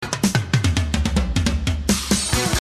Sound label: Drums